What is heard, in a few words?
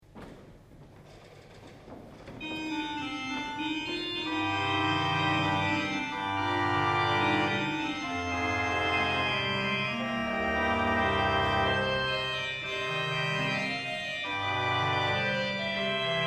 zimbel nachthorn cromorne